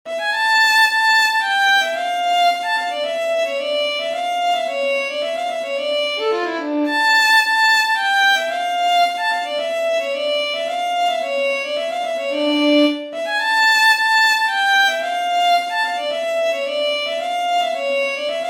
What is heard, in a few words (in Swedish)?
Polska; Kvist-Antes